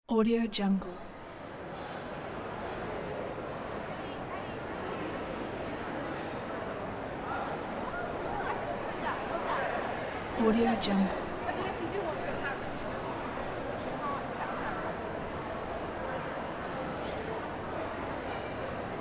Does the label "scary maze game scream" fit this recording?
No